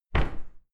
cupboard close hard subdued

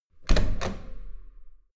metal door heavy handle use hard

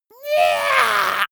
monster scream high

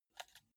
console controller arrow key press

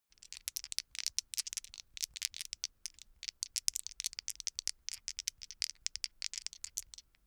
dice shake hands open long

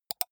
computer mouse left click single fast

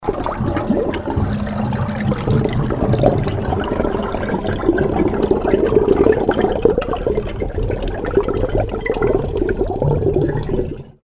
liquid environment

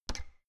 oven button press squeaky

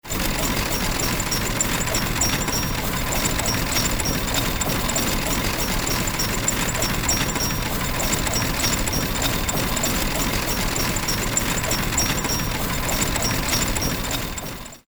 steam threshing machine

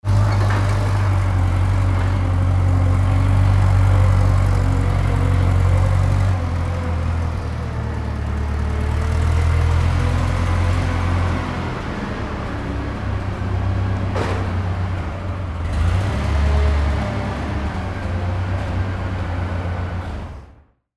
forklift truck driving

